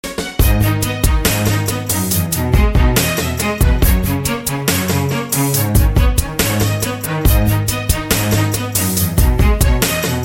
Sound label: Beautiful Violi